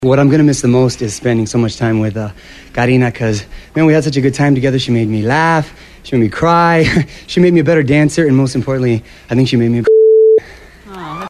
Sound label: bleep